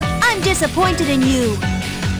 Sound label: gasp; fuuka